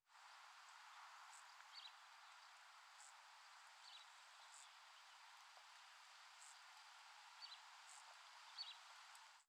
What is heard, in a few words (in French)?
Friche Industrielle Rhinau Pépillement Et Herbes